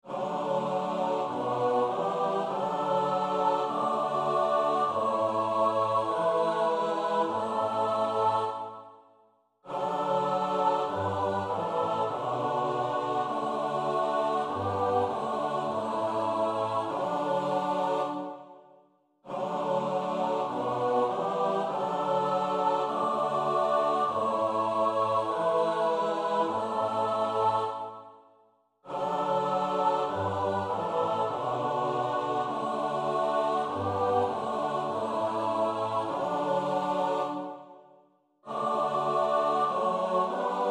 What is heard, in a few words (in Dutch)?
Psalm 138 S A T B; Goudimel; Oefenbestanden